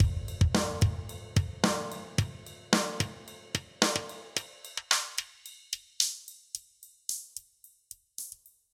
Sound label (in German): drums focusing eq aktiv sweep low to high; softube passive-active pack testbericht